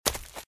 Footsteps - Leaves - Run - Right; RadwinIsland

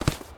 Street Ball Hit Body; SFX Kit

